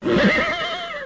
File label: HORSE